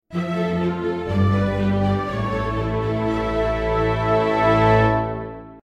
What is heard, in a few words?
Victory D Orchestra; Oscura Lost Light; Oscura Victory v2